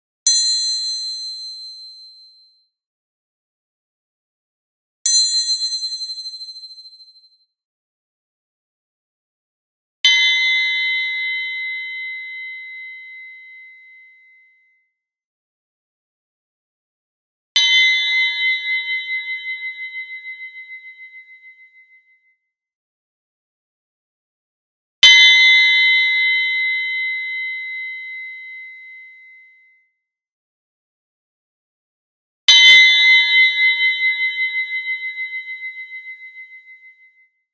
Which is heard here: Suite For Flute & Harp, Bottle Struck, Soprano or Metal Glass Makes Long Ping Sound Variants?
Metal Glass Makes Long Ping Sound Variants